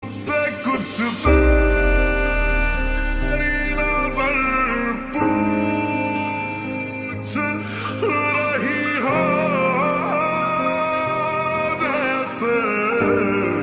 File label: Slowed Reverb